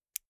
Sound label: insert xlr extension latch; objekte; elektronik